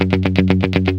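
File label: GUI 120, guitar; Best Service Gigapack 2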